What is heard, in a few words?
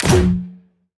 Grenade Launcher Shot